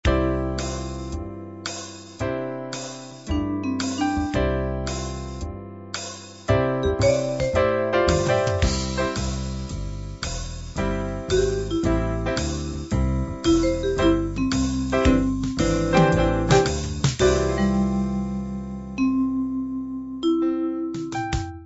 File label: slow swing